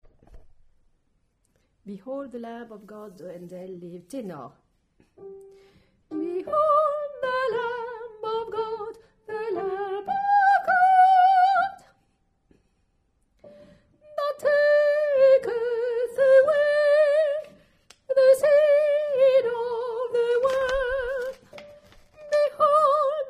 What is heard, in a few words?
Tenor; behold